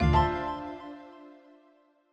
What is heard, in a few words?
Foreground; Longhorn 8 Sound Scheme by David Mounder